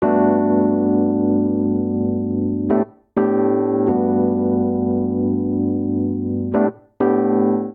Vamp Uptempo Uplifting G Major 9 124 bpm, Electric Keyboards; Ableton; Factory Packs